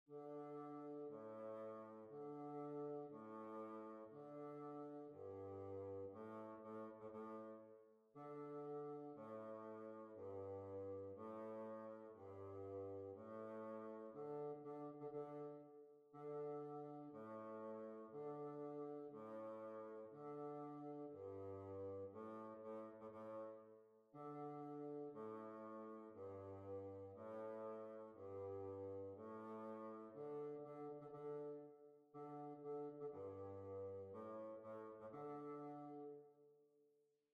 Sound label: March